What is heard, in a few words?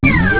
eeerrupp